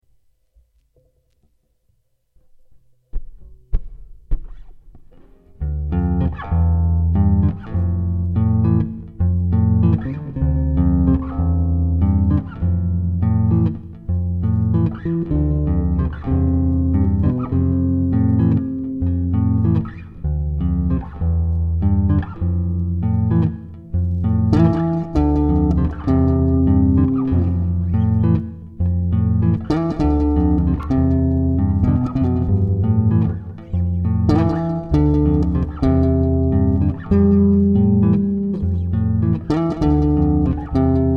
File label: Bass